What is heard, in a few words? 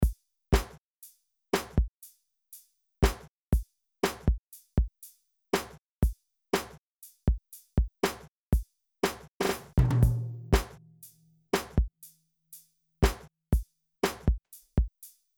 Dark Church